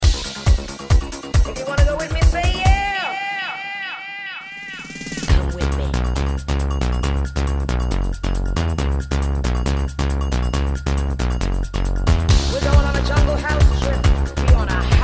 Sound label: house dance bounce